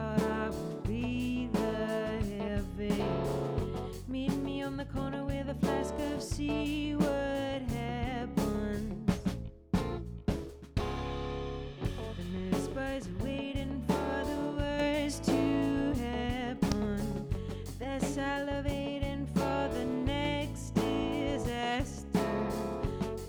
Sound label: Rough Mix L